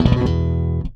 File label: Slap One Shot EB, Bass; Bass X